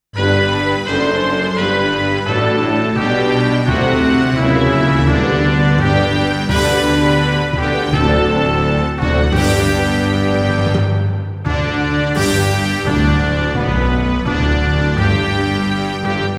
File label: brass band G D; ANTHEMS